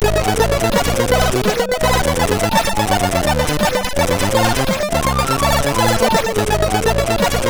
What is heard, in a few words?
sid MOS6581R3